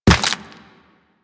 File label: Run Bone, Footsteps; Warframe; Operator